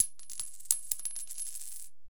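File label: Coins 3 Fall On Concrete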